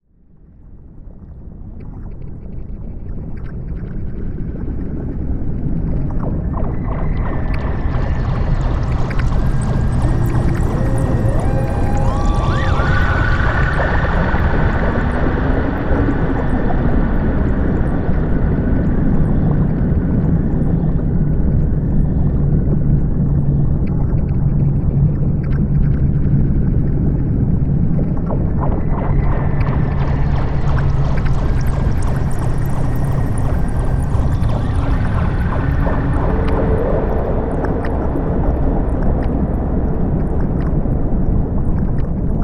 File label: glitchy Waves